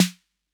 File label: 808 SNARE; Concrete Collage Drum Kit; Concrete-808